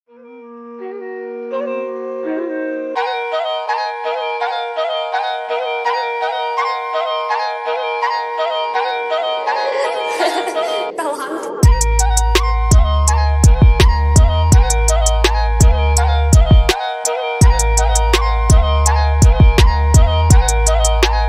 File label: TYPE BEAT Flute Drop